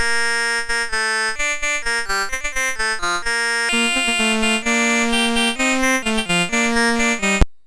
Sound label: Allemande, MOS6581R3